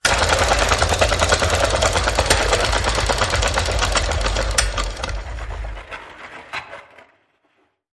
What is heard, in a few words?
Film Projector Spinning Reel